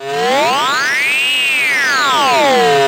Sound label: laser reveal, zaps and beeps